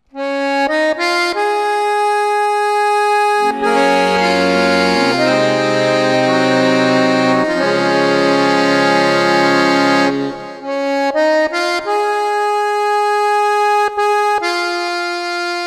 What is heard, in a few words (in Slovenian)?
akordeon